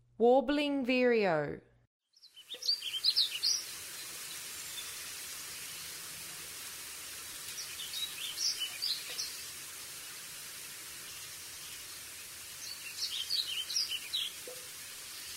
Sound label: Warbling Vireo, Songs of Birds of North America; Western USA; Western USA Songbirds